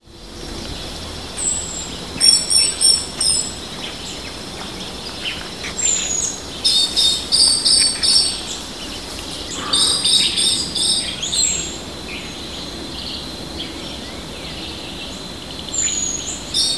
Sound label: Turdus subalaris; Serra do Lopo; Extrema; MG; Silveira